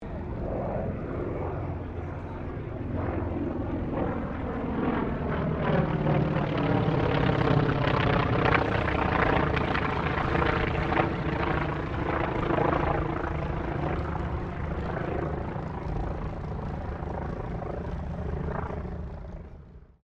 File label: AIRCRAFT T-28 TROJAN PASS; Trojan fighter bomber; Airplane; Airplanes; Vehicles